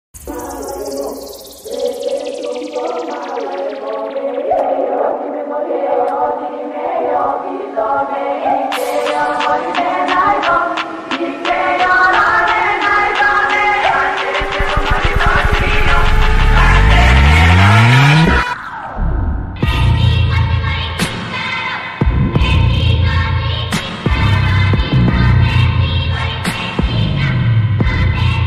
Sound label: bgm english ringtone